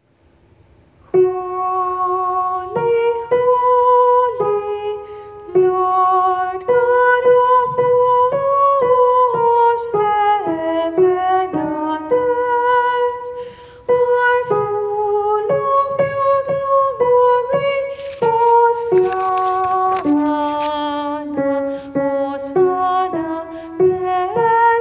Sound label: tenor